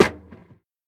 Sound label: large room snare drum sound F sharp key